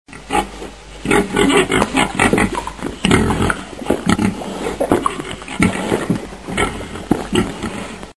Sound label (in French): cochon; animaux